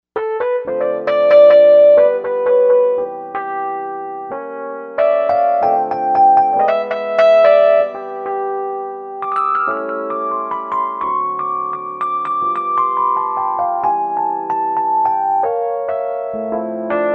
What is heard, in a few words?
Hard E.P, Korg M1Rex; Factory Patches